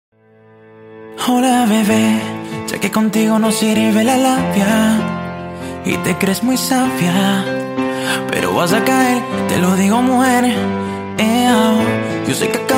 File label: Acoustic Cover